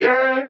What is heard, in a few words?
vocal mellow C; SD AURORA Sample Pack; Slate